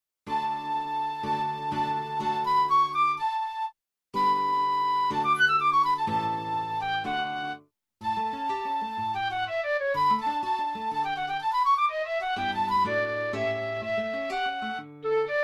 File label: serenade; Call, Leonhard von